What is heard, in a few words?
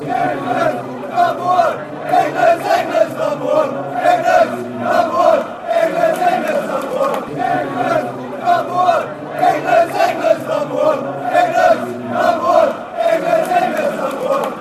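fanchants, football chants; englands number 1; atfc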